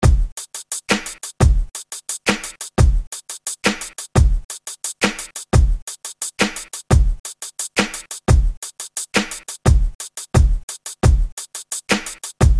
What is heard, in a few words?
250bpm